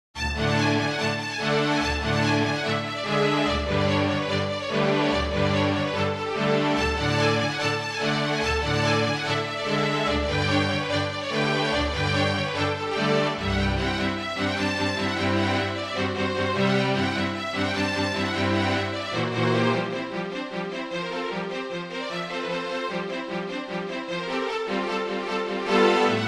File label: Bartok Dances No6, Six Romanian Folkdances; No.6 "Schnell - Tanz"; from Six Romanian Folkdances - Bartok